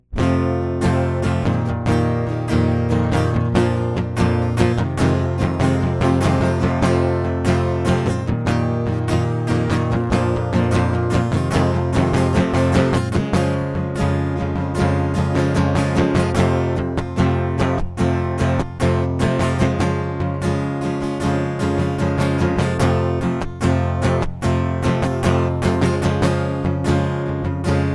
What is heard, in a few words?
acoustic